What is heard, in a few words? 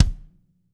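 bass drum, Drum 1 Dry; East Collexion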